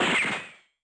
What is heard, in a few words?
Gun; Yamaha DTXpress-II; FX